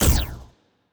fire rate laser muzzle, weapons; Stellaris; biogenesis

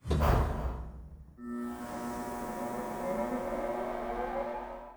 fungoid spaceport cylinders; ambient; Stellaris

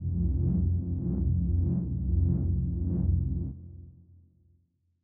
kaiju superweapon energy pulse; biogenesis

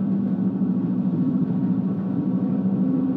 corvette hum; Stellaris

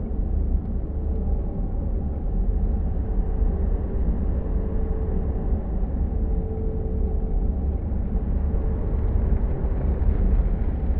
ship science ship idle; aquatics

